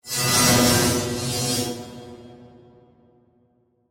Whoosh Robotic, Production, Element, Whoosh, Robotic, Electric, Feedback; BlastWave